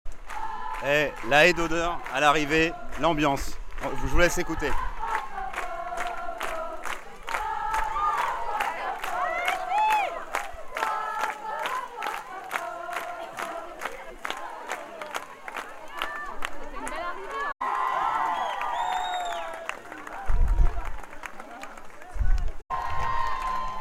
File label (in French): Ambiance Arrivee